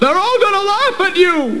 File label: laugh at